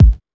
Techno B, Drum Machines, EMU XL-7

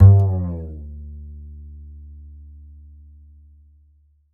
Upright Acoustic Bass, 1ST POSITION; Larry Seyer

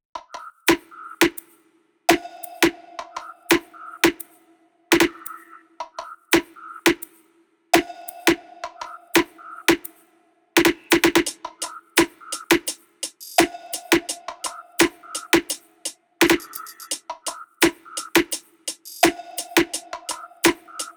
loop top 85, Seismic Loops

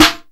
Snare, Roland JX-305

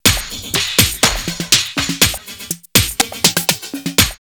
122, drumloops Techno&House; X-Static Goldmine; 90 s Sample CDs

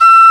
Penny Whistle E4

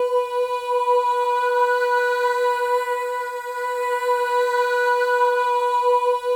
MOVING V4, Symphony of Voices; Spectrasonics

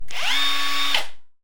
fooley

